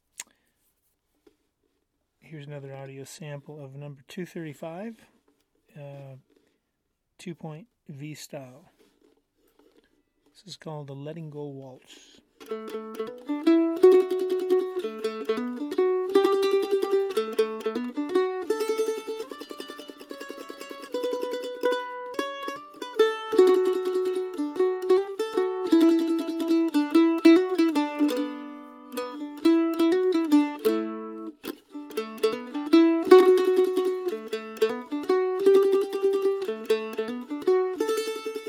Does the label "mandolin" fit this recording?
Yes